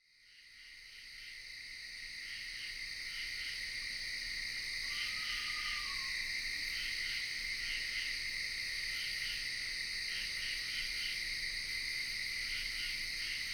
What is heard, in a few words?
Melodious Owls Voices of the Night